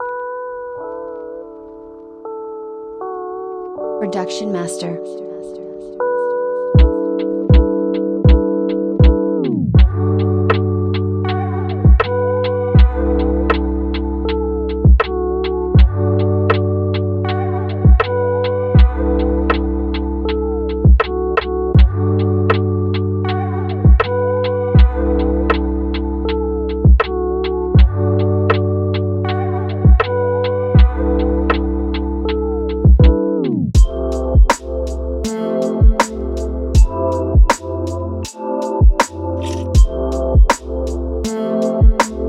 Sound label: Dreamy Lofi; Miss Me